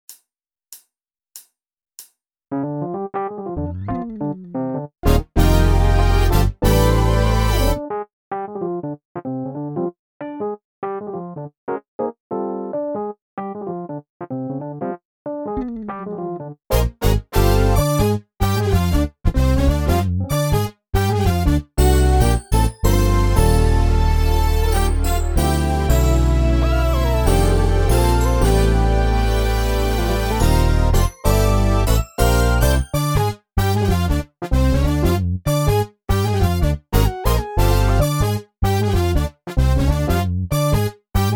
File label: drumless